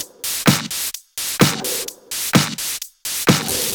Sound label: Electro Loop 128 BPM; Vengeance Samples